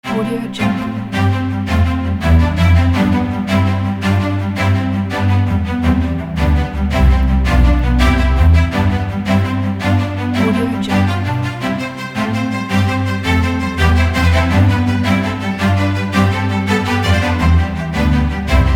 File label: classical music